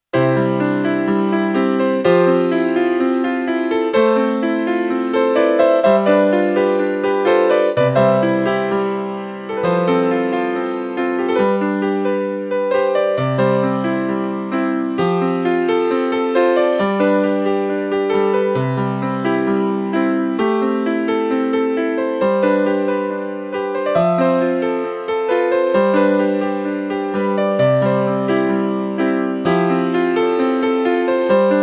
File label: Original Piano